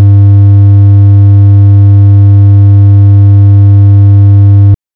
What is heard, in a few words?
sinus 100Hz